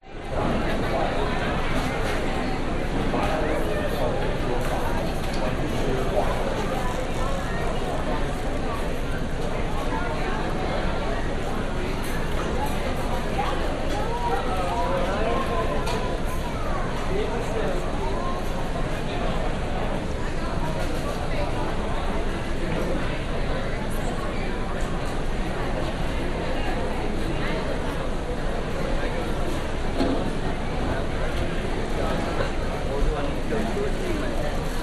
ambience usa chicago airport food hall people walla announcement binaural